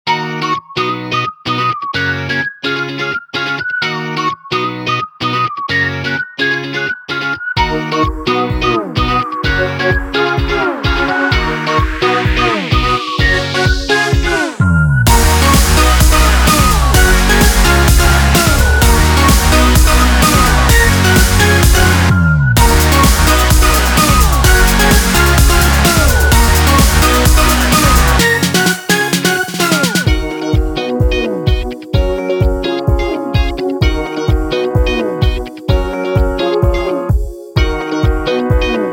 breeze groove

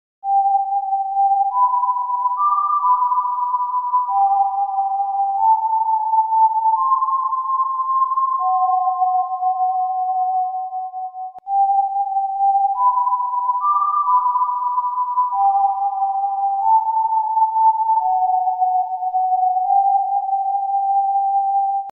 Death Whistle Sound Effect

